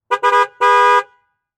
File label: Chevy Trailblazer Honk Horn Multiple; transportation